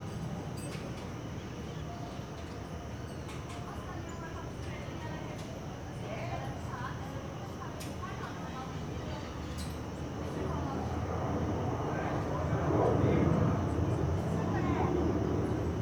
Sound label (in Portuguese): Residencial aviao passando com passaros, pessoas; maquinas